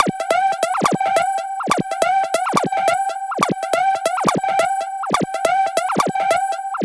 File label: 140BPM G; Vengeance - Essential Clubsounds Vol.4